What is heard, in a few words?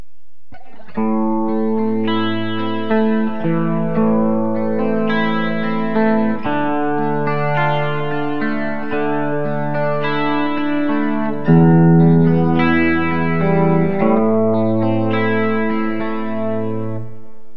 bridge clean; dragon; burny; fugly clips